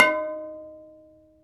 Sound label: MALLET KAL; World O' Kalimba